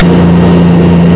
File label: engine